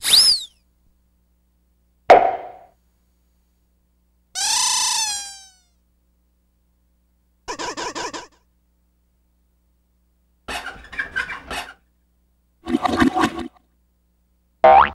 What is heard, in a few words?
Buzzes Honks Whirls, Buzzes and Honks and Whirls and Pops Many Car Horns; multi